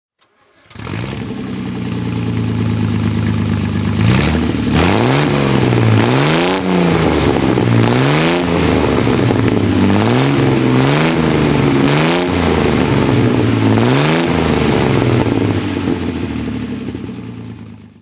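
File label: Healey 3000 mk II throttle; healey3000mkll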